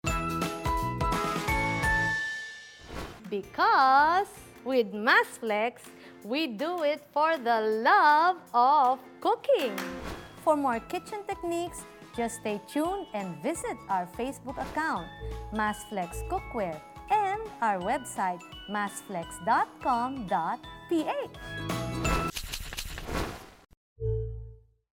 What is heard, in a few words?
JINGLE; MASFLEX